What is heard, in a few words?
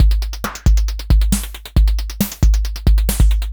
Anabeat Mix, Beats